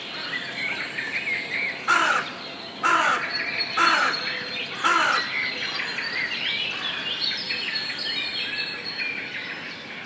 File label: crow